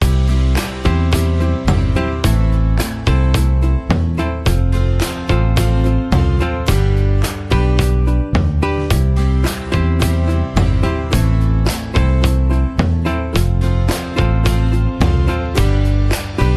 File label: music Happy; orlamusic